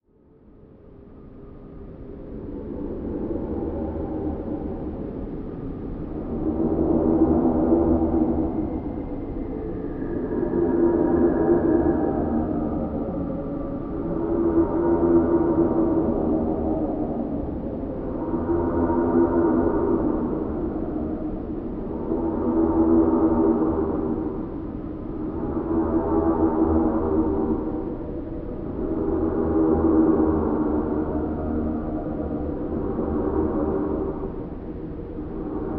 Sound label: Horror Ambience